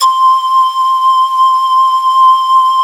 INCA PAN C5, SYNTH; Q Up Arts; Dream Experience

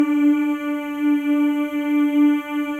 MALE MMS, Symphony of Voices; Spectrasonics

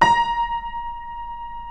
A#4 L, Keyboards Acoustic; USB Soundscan; AKAI

